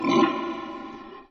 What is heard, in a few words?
Growl